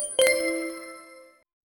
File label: notifications chime synth